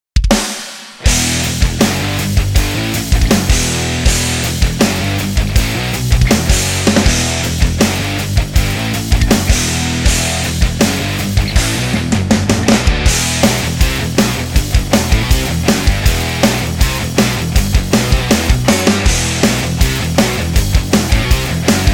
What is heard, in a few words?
metal, power drum kit